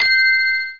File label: error